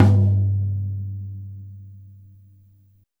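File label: Jazz kit tom, Drums Acoustic; AKAI; JAZZKIT 2; 90s sample CD; USB Soundscan vol.10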